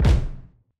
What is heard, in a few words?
dog day stomp; dognap